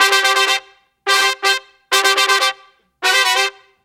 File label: Big Band, brass